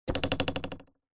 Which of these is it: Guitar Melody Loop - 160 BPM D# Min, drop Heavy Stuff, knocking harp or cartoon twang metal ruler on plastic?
cartoon twang metal ruler on plastic